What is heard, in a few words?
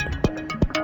tune